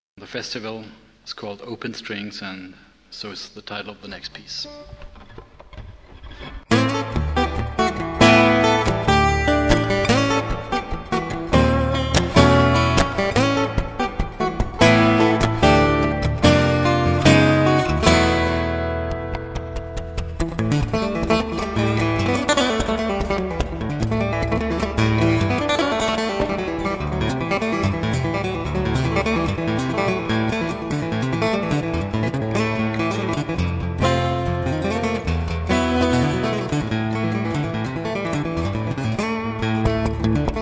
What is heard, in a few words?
acguitar open strings